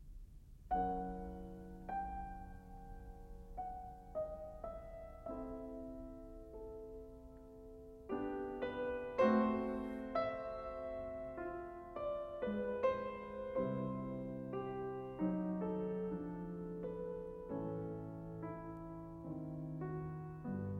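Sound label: Piano Sonata No. 2 in B flat minor; 24 Preludes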